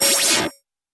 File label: Quick Metallic Zap